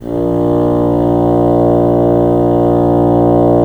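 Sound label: wind bassoon; LA Composer vol. 3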